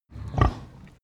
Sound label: pig grunt breath